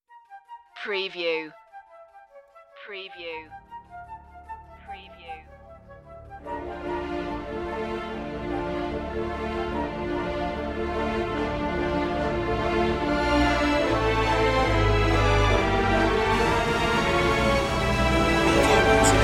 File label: ending only